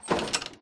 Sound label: door close